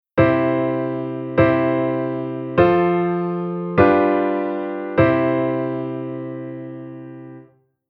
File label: Chord Inversion C C F G C